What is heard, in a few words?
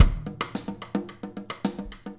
108 RIM, drum